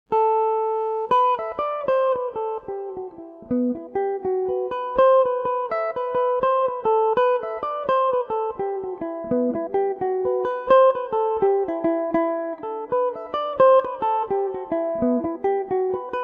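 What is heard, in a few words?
E minor, slow version, irish mandolin; white petticoat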